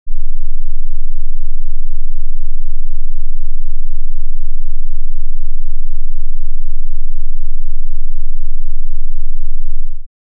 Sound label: 12 HZ; Tone Pak